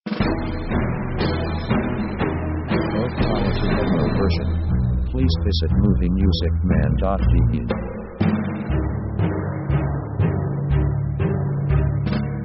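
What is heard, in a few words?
orchestra strong stac march; Action themes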